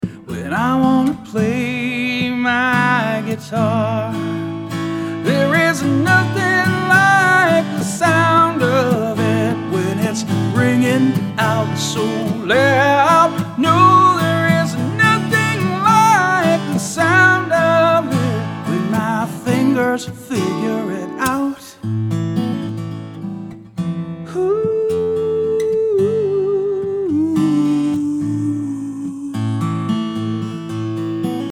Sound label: Guitar